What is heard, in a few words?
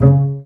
BA PIZZ C2; Best Service; Peter Siedlaczek's Advanced Orchestra